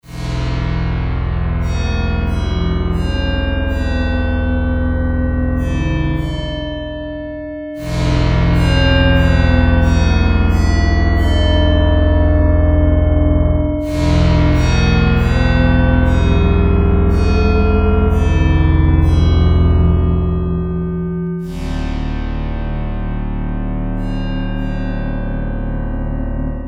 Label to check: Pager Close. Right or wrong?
Wrong